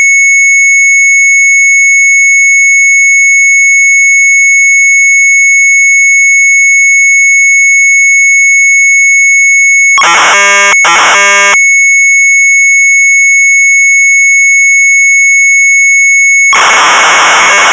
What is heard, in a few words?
MZ800 Tape, sharp; mz800 wav; SKIEN